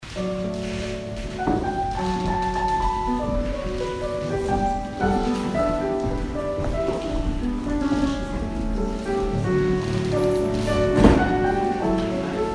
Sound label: piano interlude